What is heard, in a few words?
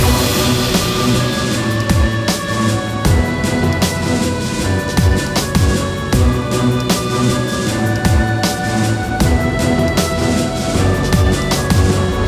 music castle; Castle Storm